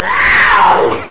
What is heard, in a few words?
roar